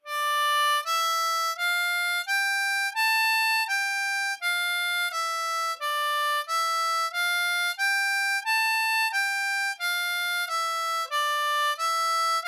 Single Note Exercise, D Minor, Blues Harp

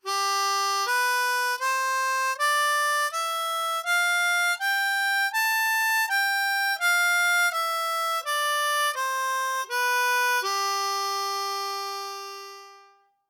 Cross Harp Scale In Holes 2-6

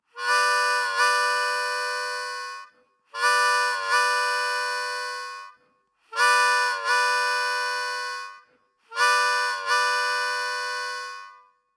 Train Whistle Exercise, Hole 3&4, blues harp

